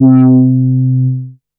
MOOG BASS C4; Best Service Dream Experience

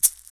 New Shaker

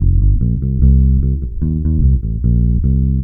GREEN 74 C, Bass Grooves; USB Soundscan vol.30; AKAI

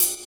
Ride; Equipped Music; Loungin House

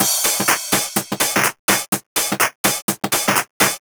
Break, Drum Loops 125bpm, Nu Dance Electro; Chicago Vibe

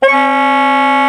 SOPRANO SAX; Northstar - New Gold VOL-1

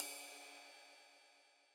Yamaha DJX 2B, Ride Cymbal

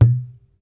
TABLA LOOP EN; Spectrasonics; Heart of Asia; INDIAN SET

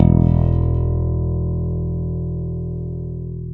WAL BASS; AKAI S6000 CD-ROM - Ultimate

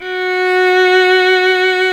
VIOLIN F#4, SOLO STRINGS; Greytsounds VOL-1

